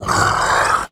zombie

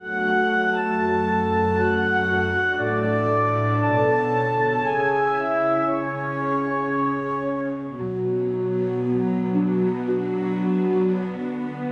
calm

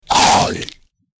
hit, troll

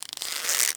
bow; weapons; bow arrow

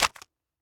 set, figures set on table

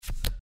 card flip; effects

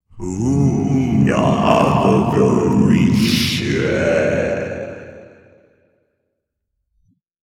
stinking cloud, magic

